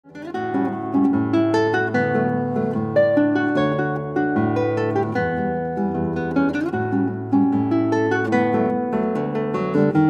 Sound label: Guitar